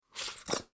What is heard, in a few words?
Food Ketchup Bottle Slurp Suction; Household